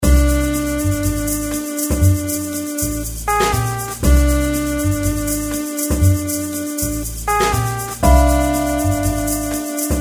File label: 120 lent; relaxing music background music